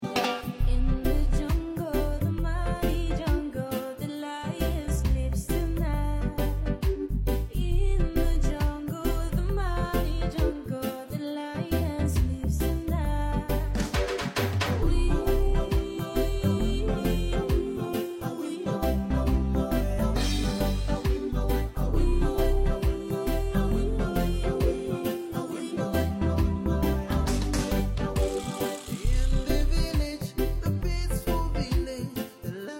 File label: Acoustic Sounds Reggae Version